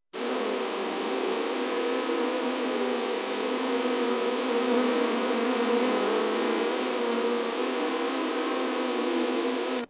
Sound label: bees